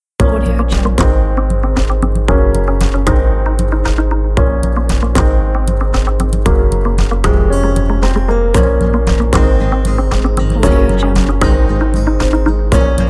upbeat acoustic energetic uplifting pop

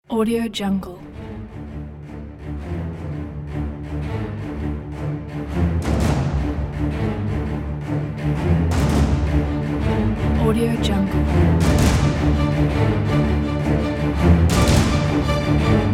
epic dark

